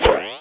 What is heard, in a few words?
jump